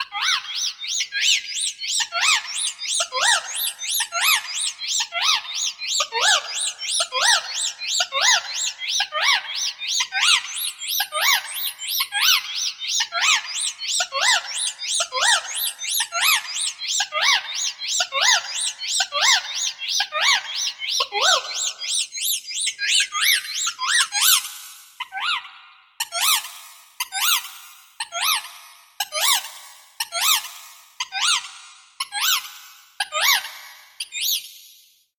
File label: bird tweet